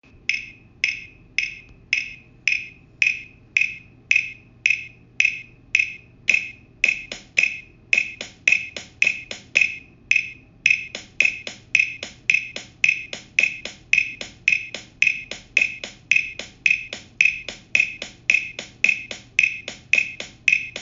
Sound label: tenderfoot percussion